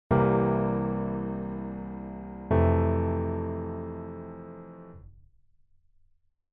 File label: C7 Fmaj7